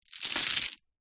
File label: industrial chain heavy lay down on ground